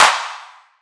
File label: drum clap tailored; Virtual Riot; Drum Hits